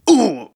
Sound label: Battle Wound; Zote